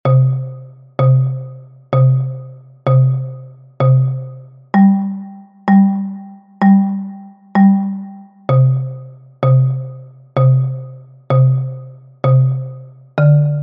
NON CHAS QUERO Xilofono Baixo

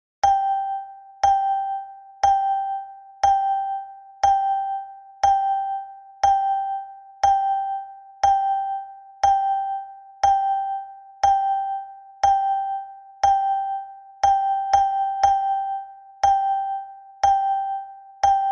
Metalofono Soprano

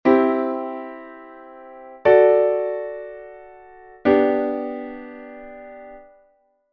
acordes maiores, Perfecto Maior, DO-MI-SOL; FA#-LA-RE; SI-RE-SOL

